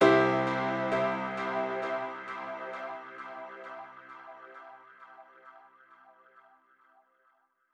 134BPM Piano Short, C major; Unlooped

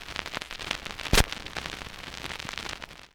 Record Start, Vinyl Record

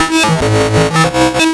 Arcade Riffs

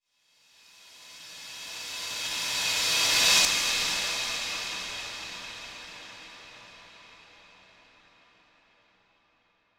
137BPM Re Crash; Sound FX; Amajor

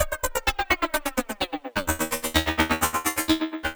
128BPM Tribal Kiss Melody

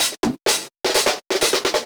Minimal Fills; Minimal Loops

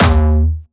D#2, Jumpstyle Kick; Bassdrums; Jumpstyle Multis; vengeance essential club sounds-3; VEC3 Bassdrums

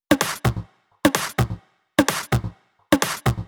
Drumloops 128BPM

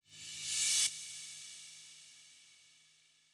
135BPM Reverse Fx; Sound FX; F#minor

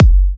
Kick; 128BPM; Vengeance Samples; Guess What Kit

